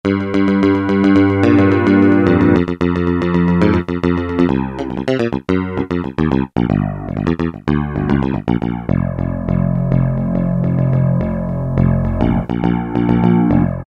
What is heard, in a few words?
Pick Bass, Roland U-110; Factory Patch